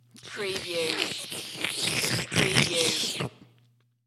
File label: Slurp